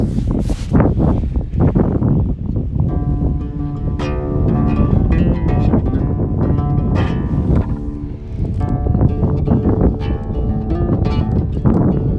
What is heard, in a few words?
bells